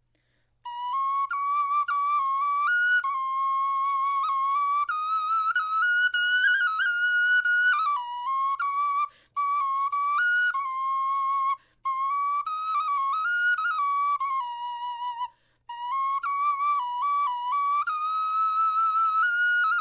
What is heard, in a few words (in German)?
melodie